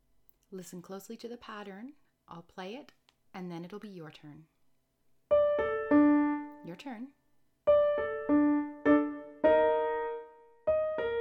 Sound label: octaves